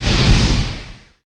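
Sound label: ice spell; kult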